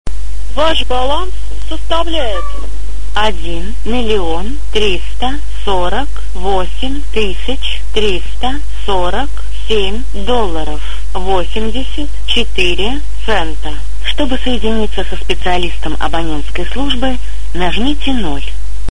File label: Melody